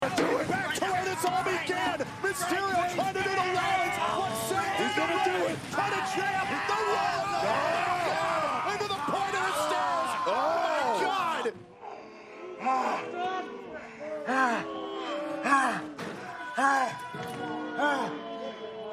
low blow stunned crowd

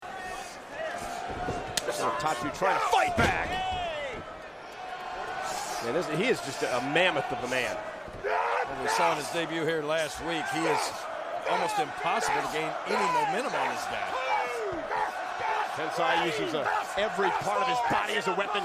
chants; Albert

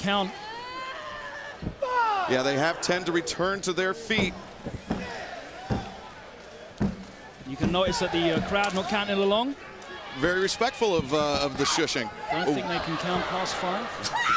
respectful crowd

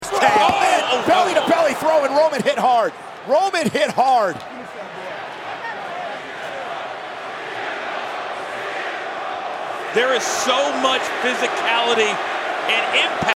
CM Punk chant; roman reigns brock lesnar; the fans at the most important and expensive show of the year chanted, "CM Punk"

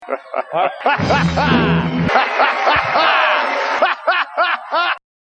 laugh

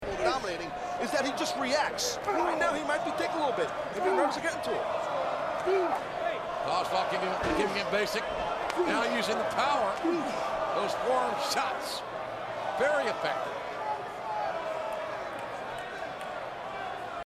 more boring chants

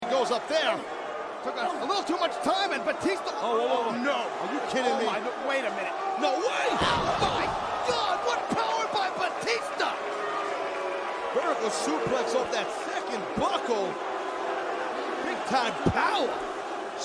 suplex pop; big show vs batista ecw

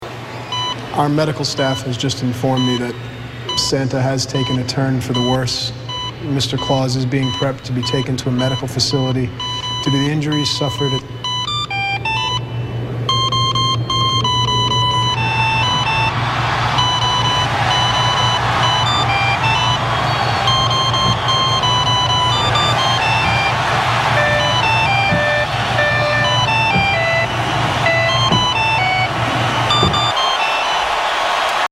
ekg jingle bells

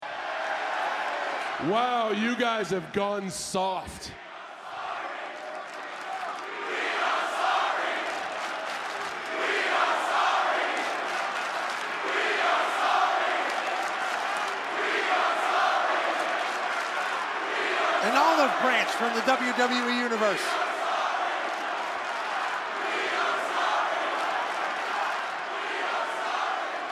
we are sorry chant; john cena retirement

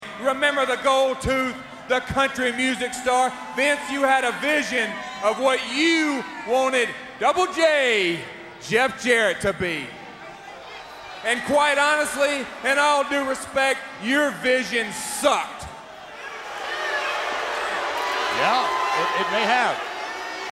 country music; return of double j jeff jarrett

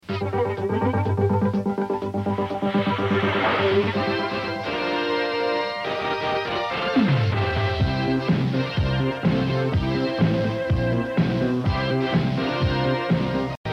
synth; wrestling country boys

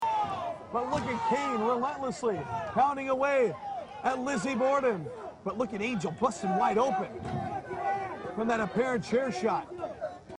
chair shot

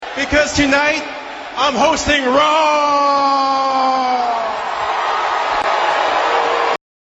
raaaaaaaaw; johnny damon

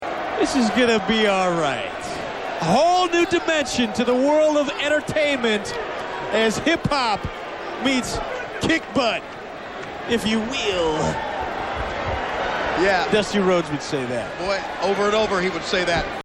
hip hop

